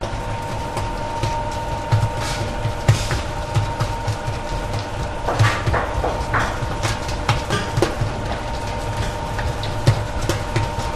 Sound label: German Bakery Doug Mixer Running Bakers Working In Background; your free sounds